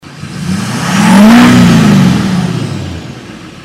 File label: lmm chev rev